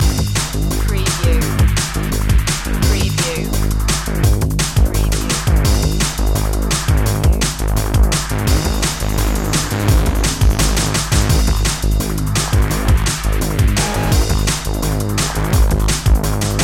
lost in space loop

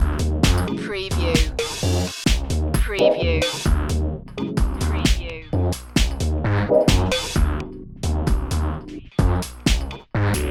positron loop

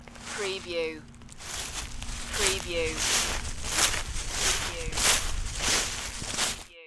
Leaf Walk

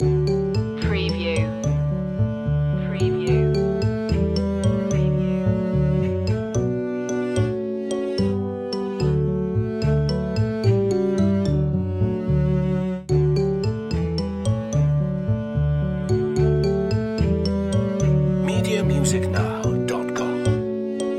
hickory dickory dock instr loop

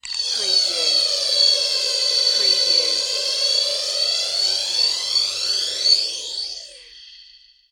Servo Motor; sound effects bible

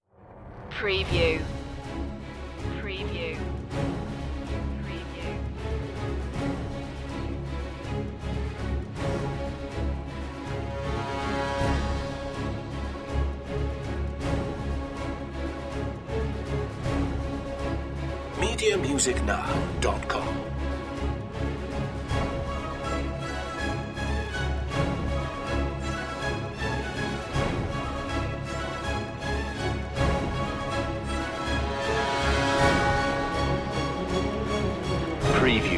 no choir

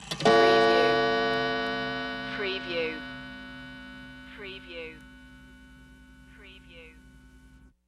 Clock Chime Gong Single

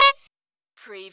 CARTOON BIKE HORN SINGLE

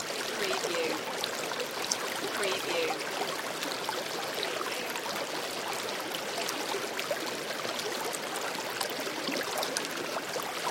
Gentle Stream Water Loop

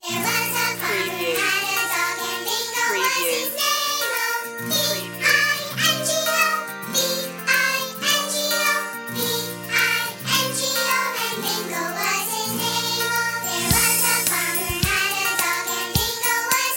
chipmunk vocal

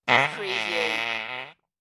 Long Fart

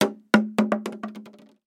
Jug Plastic Drop; Sound Effects Bible

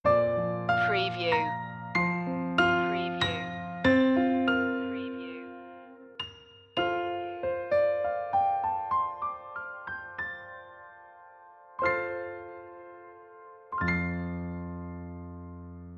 piano; the first noel; media music now

